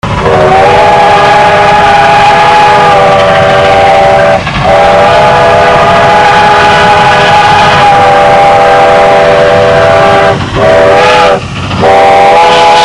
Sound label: steam